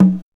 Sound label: CONGA